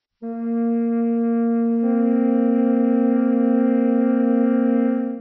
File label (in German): flöte b1 c2; Intervalle, die man durch Potenzen von 2 und 3 darstellen kann